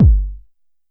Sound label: BD Ana Tight, YAMAHA RM1X